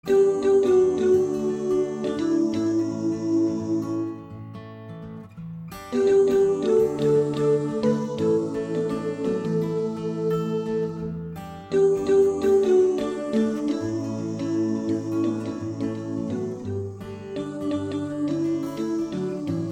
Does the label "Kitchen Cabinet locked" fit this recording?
No